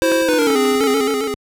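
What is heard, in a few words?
Jingle, Game Boy Color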